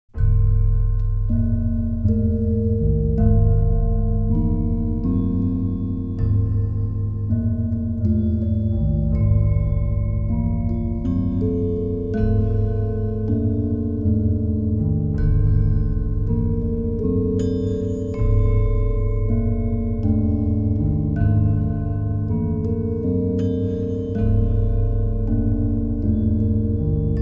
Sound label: gamelan